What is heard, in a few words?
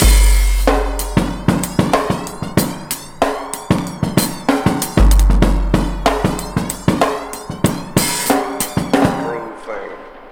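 drum, Breakbeat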